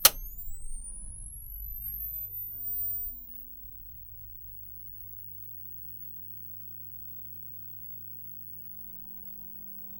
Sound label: crt television static, power off